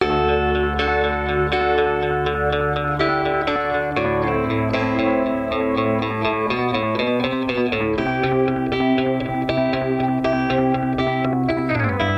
wistful hippie

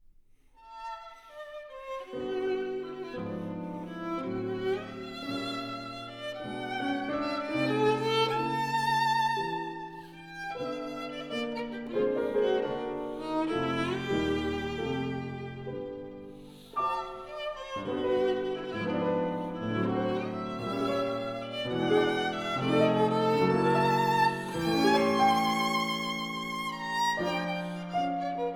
short stories wet

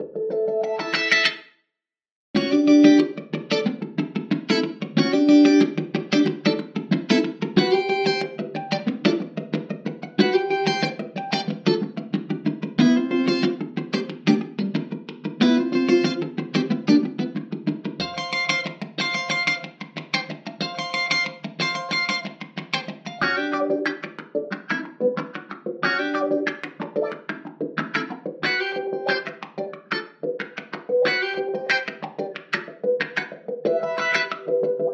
electric guitar dark

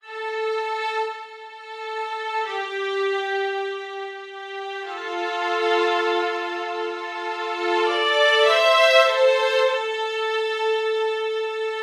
violin strings

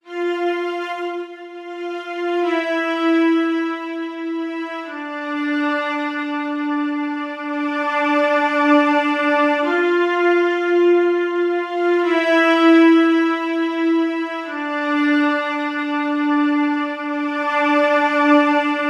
viola strings

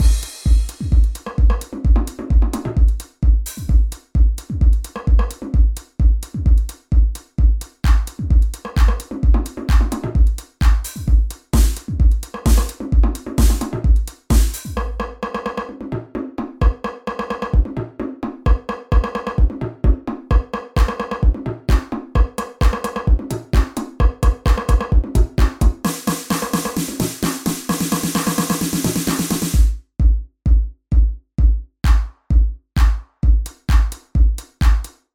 tape 909 bass 82hz

